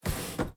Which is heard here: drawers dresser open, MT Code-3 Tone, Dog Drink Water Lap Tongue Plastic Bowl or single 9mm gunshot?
drawers dresser open